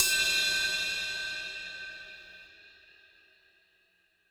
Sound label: Ride Acoustic Glassy Verb